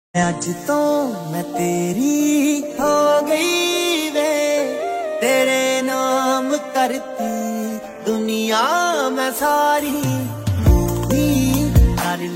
song ringtone

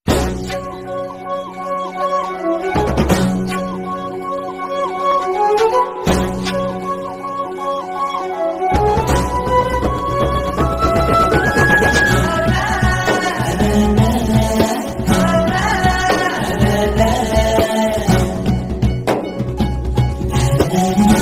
Telugu beautiful bgm ringtones

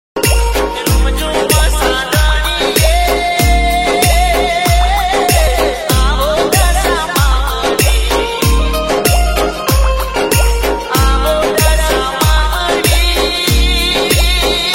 dj remix song ringtone; Jay dashama

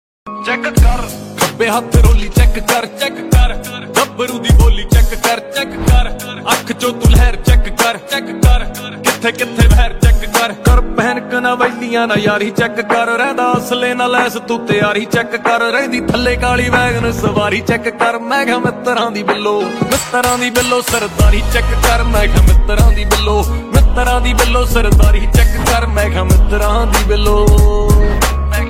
punjabi ringtone

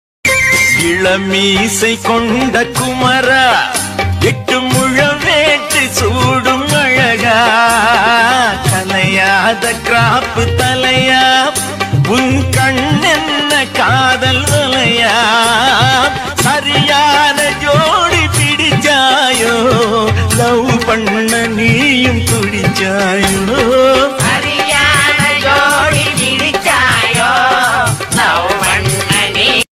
tamil bgm ringtone; murugan

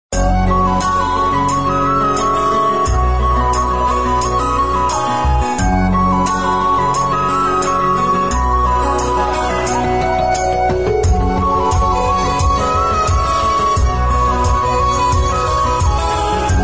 Instrumental Ringtone

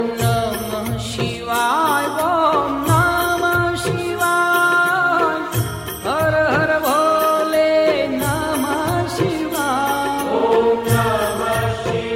ringtone, gujarati

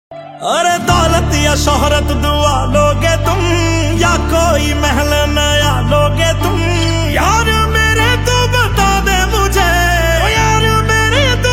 ringtone hindi

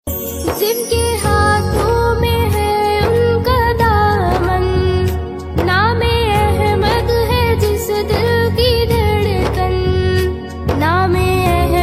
Beautiful Naat ringtone

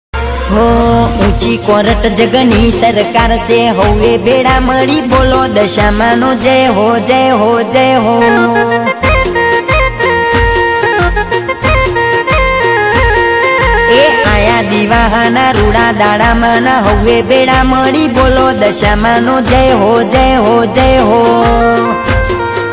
Gujarati new song ringtone; Dashama

